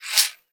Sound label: CAXIXI